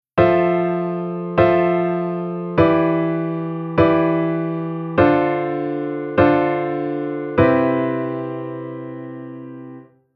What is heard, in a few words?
Chord Modal